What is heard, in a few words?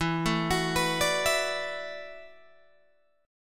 E 7th Suspended 2nd Suspended 4th, down, Guitar, Open G